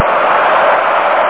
jubel; Aminet 21; GTI - Schatztruhe; Amiga 8-bit Sampled Voice; 8,363 sample rate

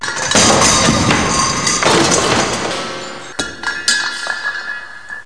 rumpel; CD-ROM Magazin 1996 September

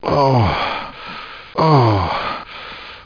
STOEHN

